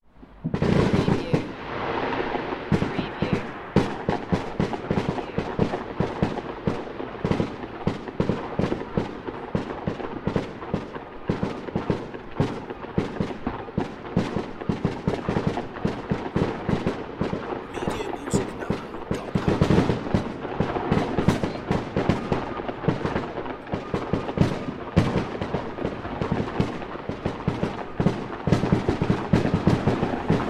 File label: fireworks; beanstalk audio